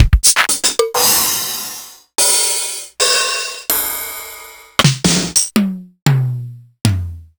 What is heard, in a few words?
Korg DDD1